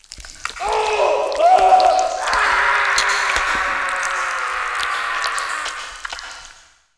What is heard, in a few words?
zombie eating; svencoop; russian sounds